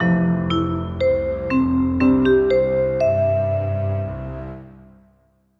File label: jingle relax chime